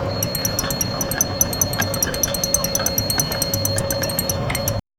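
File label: soft café chatter mingles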